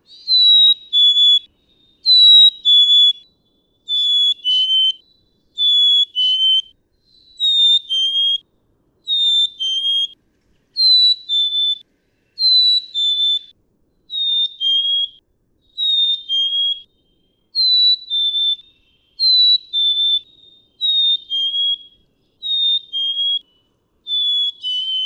Black-capped Chickadee; Pitch-shifting, retaining melody—black-capped chickadee

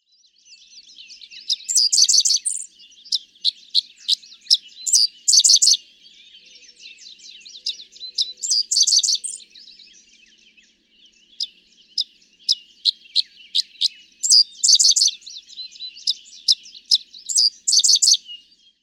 Dickcissel

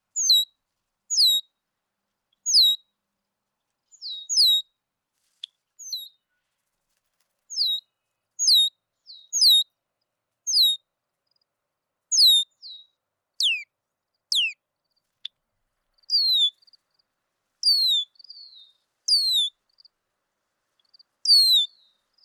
Red-winged Blackbird

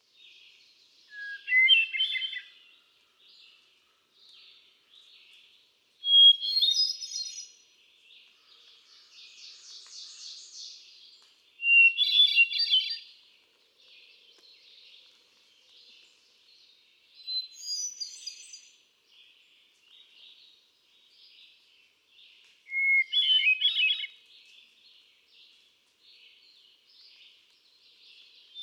Hermit Thrush

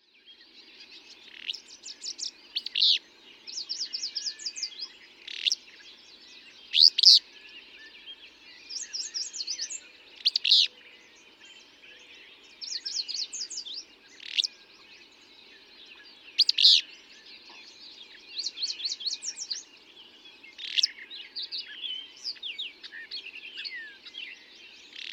Dawn Chorus, A chorus of singers, at dawn

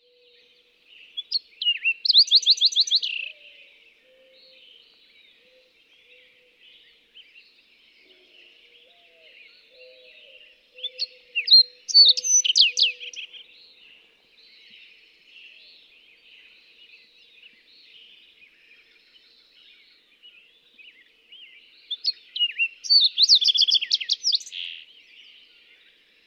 Fox Sparrow

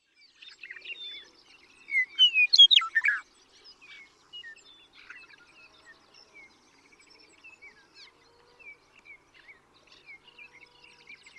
Western Meadowlark; The joy of western meadowlark songs.

